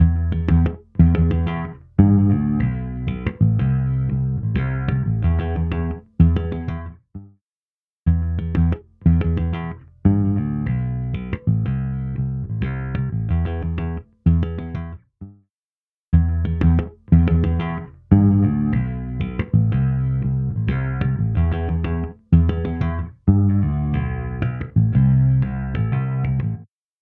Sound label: Bass Decay Chorus; Preset: Bass Decay Chorus; Physion Mk II